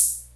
KORG SR120

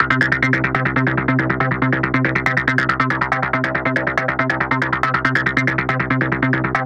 140BPM C; Vengeance - Essential Clubsounds Vol.4

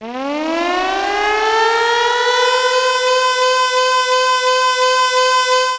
ALARM

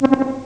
Blub; ST-XX Amiga Soundtracker Sample Packs

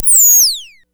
Click and Whirl one shot, SFX; Sonic Foundry (Sony Creative Software); Electronic Point Blank

